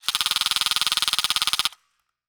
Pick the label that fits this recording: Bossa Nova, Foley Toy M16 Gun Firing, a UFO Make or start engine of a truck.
Foley Toy M16 Gun Firing